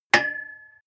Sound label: metal impact pole high medium; hits explosionen; metall